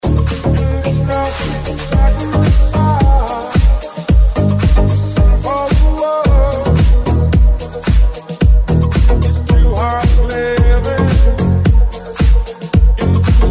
sax deep cover

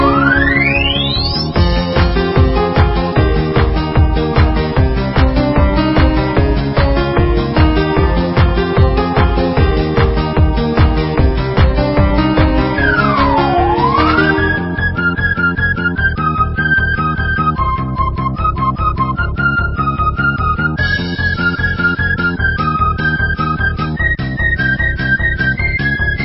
techno

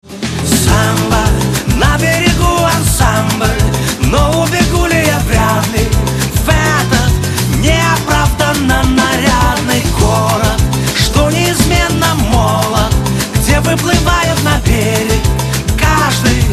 samba

